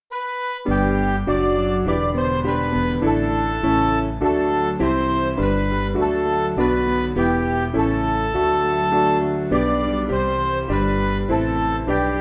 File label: O Day Of Rest, Midi; Woodbird